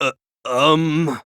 "uh uhm"

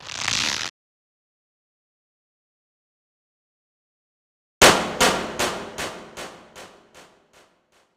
breakdown

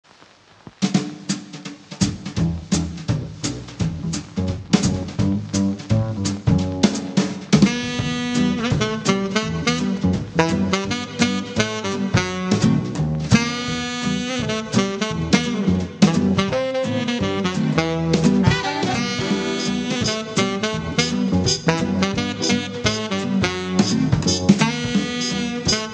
instrumental; cuphead; mr king dice

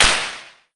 suspend slap; mamemomi

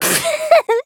pffft; saionji

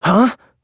huh; nagito

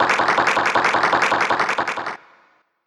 encore clap; pmd

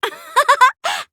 giggle; tenko

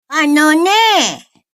think; monokuma

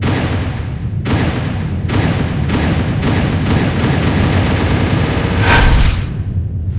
rapid fire bam; bobby

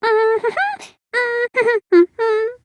hum; monaca

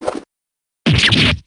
shank; shiv

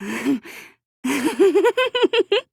giggle; toko

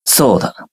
hmph; twogami

